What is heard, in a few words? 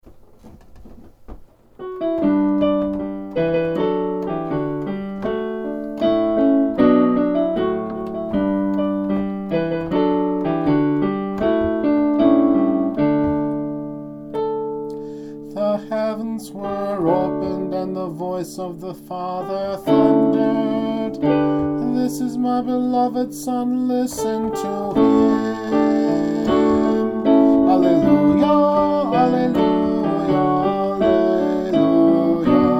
Christmas Alleluia; Baptism